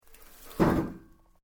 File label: METAL GIRDIR MOVE; Materials